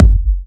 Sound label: Trance Kicks; Vengeance - Essential Clubsounds Vol.5; Bassdrums